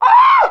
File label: widow pain; rogue